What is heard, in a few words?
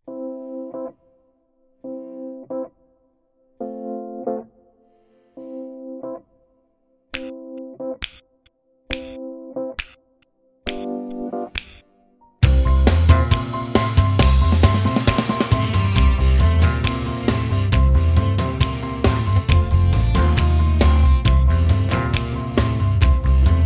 Instrumental